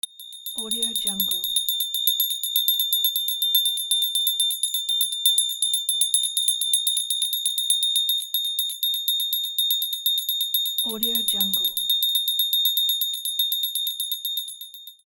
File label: bell